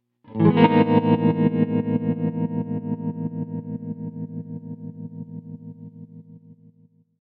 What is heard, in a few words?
Tremolo Triangle